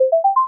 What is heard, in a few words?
success, ui sfx